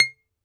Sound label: BALALAIKA, Prima Balalaika, Plucked Strings